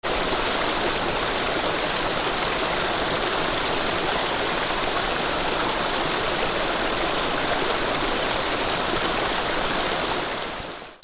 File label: WATER